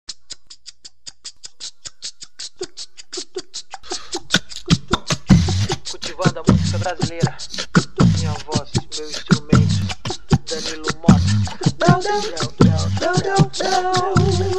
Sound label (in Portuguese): minha voz meu instrumento